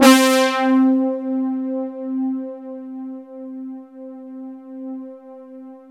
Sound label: POLYBRASS, JV-1080; Syntec; Wall of Sounds